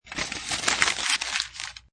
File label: Tinfoil, Tin foil paper being unwrapped; Kitchen; Household; Michael Huang